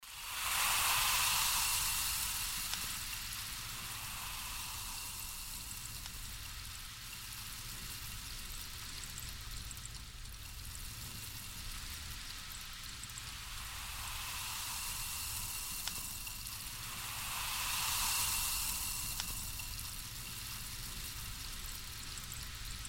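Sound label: foam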